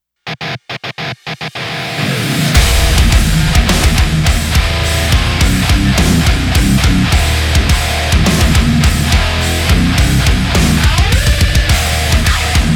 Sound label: modern metal